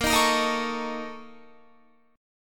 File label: Bb Minor Major 7th Double Flat 5th, Guitar; strummed; 4E,4A,5D,5G,5C,6F; 18,18,20,20,21,20